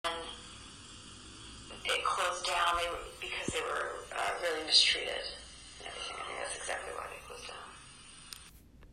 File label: EVP; Room 17